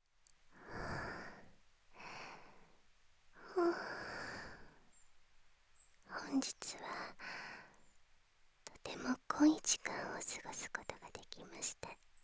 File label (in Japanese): 添い寝